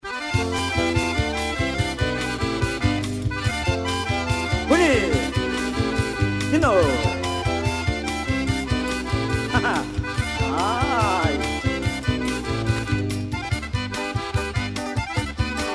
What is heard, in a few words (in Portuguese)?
Pout Pourry Instrumental